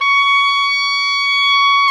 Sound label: oboe, mf f; Roland L-CDX-03 Disk 1; Oboe 2&3